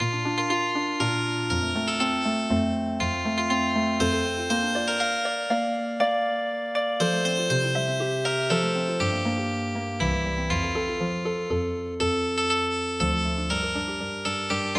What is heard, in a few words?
Healing Harp, traditional; Schweizerpsalm